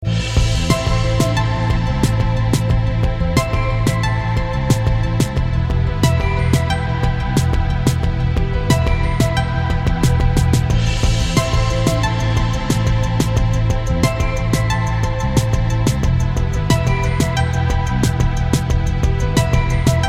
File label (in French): nanana rythmé électro